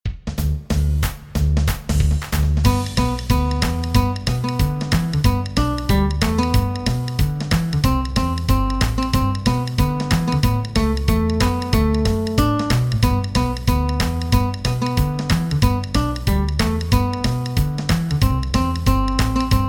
Guitarra Punteo en SOL Mayor; Jingle Bells Jazz; Tablatura con números

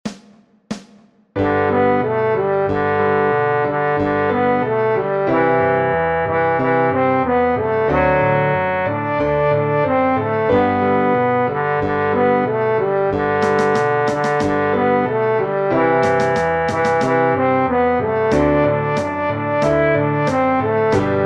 Jingle Bells Notas en Clave de FA para Trombón Chelo Fagot; 50 Vilancicos

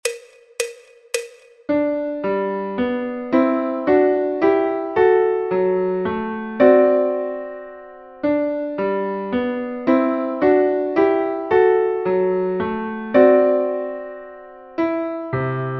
Celesta Aida Piano Fácil

